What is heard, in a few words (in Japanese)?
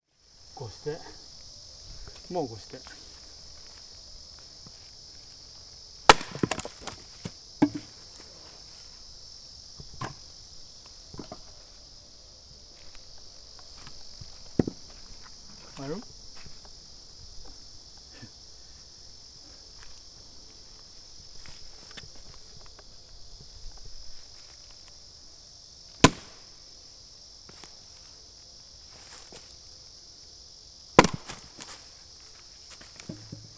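mori no naka makiwari, wood splitting in the forest; Chino Cultural Complex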